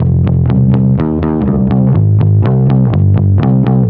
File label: Bass, Trip-Hop Loops; Sonic Foundry; Rondo Brothers Trip-Hop Loops & Samples